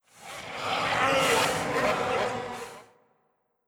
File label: Monster Altar